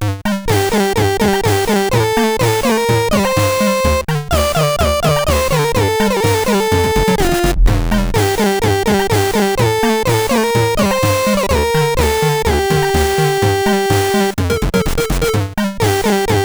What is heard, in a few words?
CSG 8580R5; Deepcore; hvsc